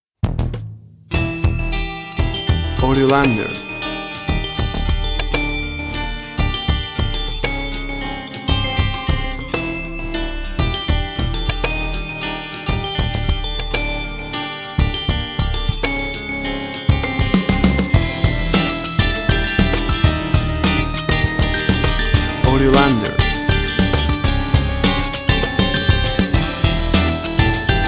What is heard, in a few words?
Decadence Investigation suspense feel intrigue